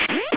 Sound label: jumping